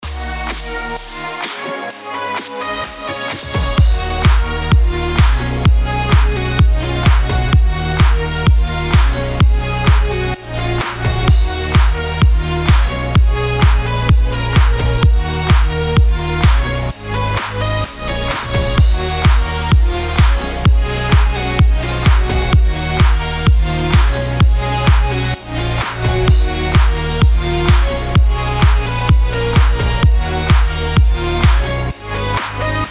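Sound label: BED EDM D# minor 128BPM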